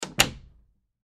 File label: Door Close Laundry, Door Close Laundry Room; Miscellaneous Doors; Household